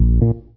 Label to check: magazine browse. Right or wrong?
Wrong